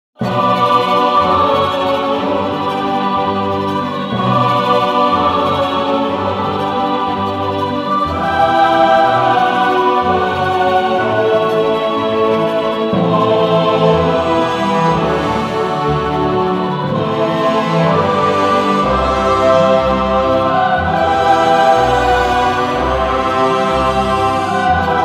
Celestrial Waltz

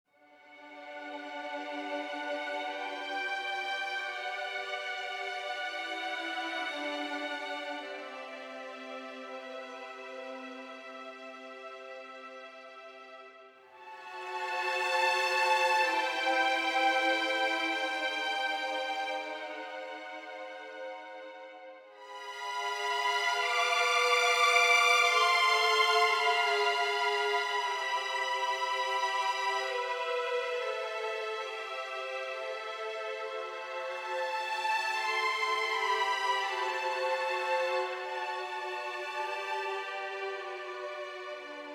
Melancholia (Cantabile Violins)

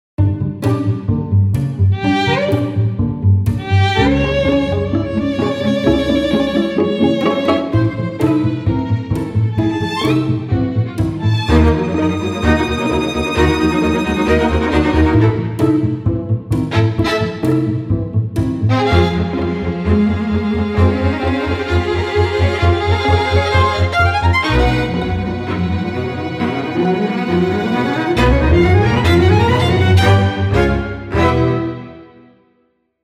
Chamber I solo; Dietz

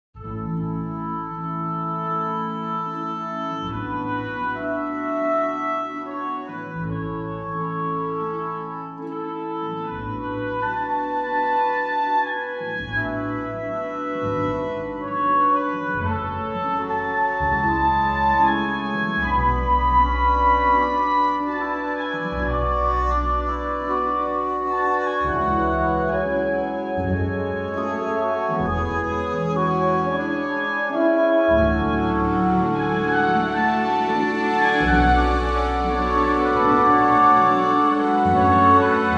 Oboe Ensemble

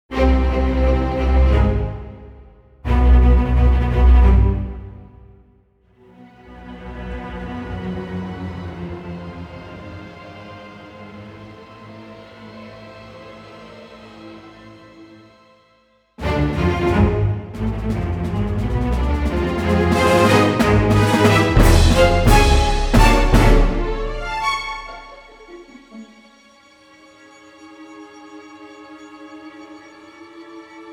Epic Orchestra strings

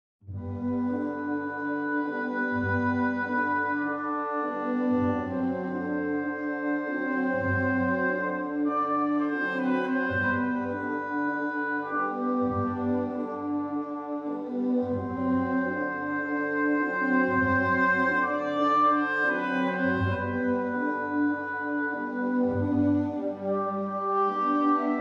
Gentle Waves

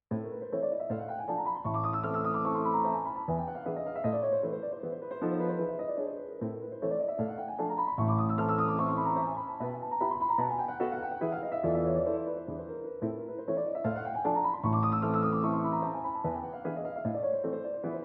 Etude in A minor; Etude Op. 10 No. 2; Chromatic

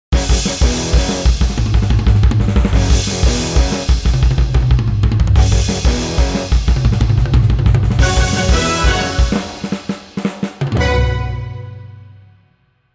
Power Drums

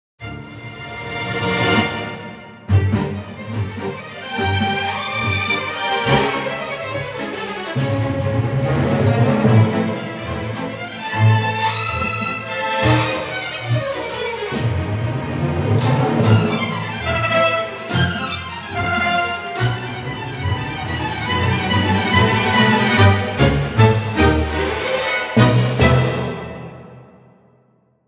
Orchestral strings

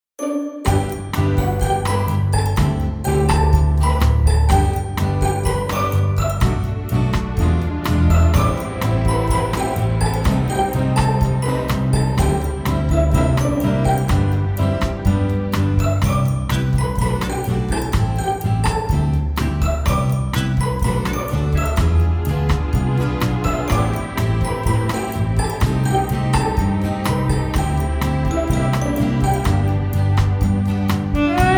Auld Lang Syne

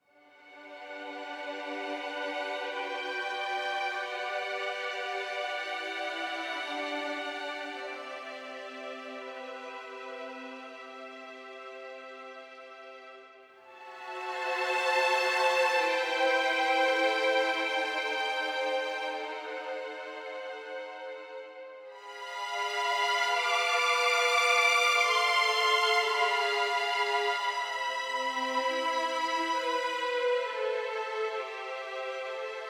Cantabile Violins

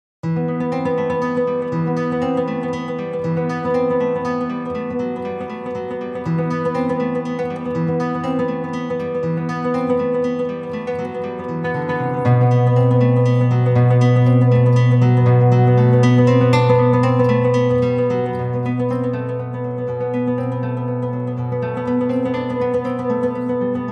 guitar; Leyenda

